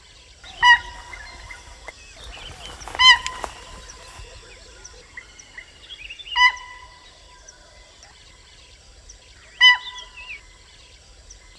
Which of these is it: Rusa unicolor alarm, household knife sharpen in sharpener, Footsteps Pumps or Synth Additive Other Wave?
Rusa unicolor alarm